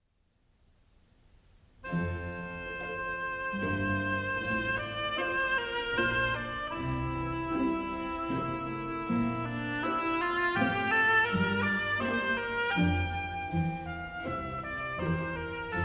Concerto for Violin, Oboe and Strings in D Minor, Adagio